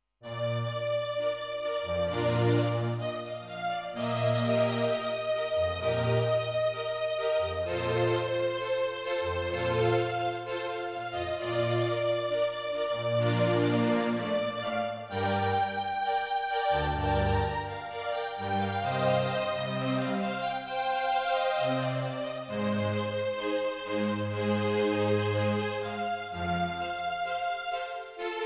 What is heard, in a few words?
Strings